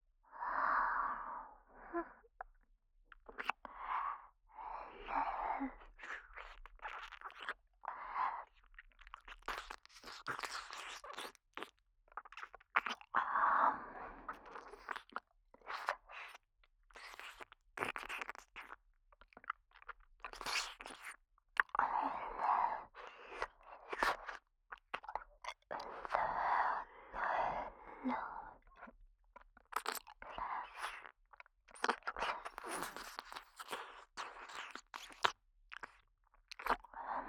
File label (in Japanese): 耳舐め, 左右反転, ASMR